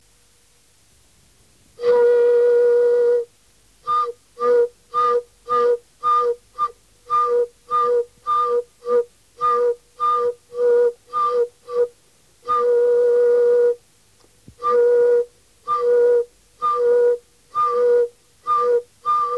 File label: pifullka